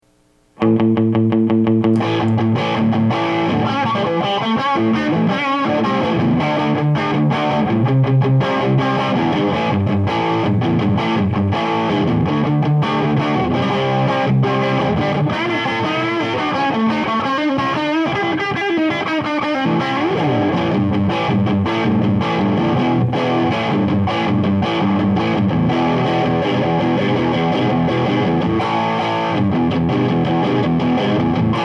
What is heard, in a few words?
fuz moderate loose